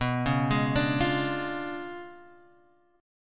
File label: Db 7th Flat 9th